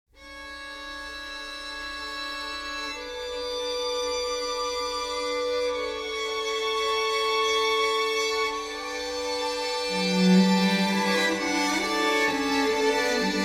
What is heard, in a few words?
Elegant Seasons, ringtones; LG; VELVET 2 Pro